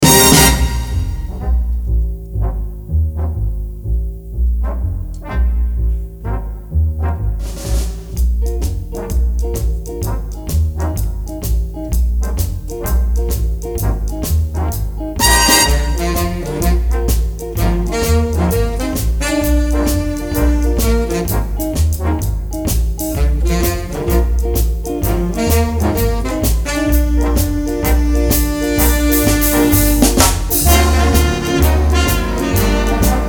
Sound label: Main Line Shuffle